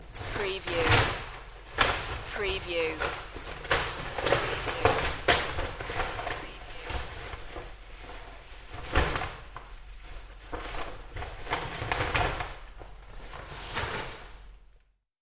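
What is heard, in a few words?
Trash bag Noise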